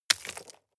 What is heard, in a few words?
drop stones small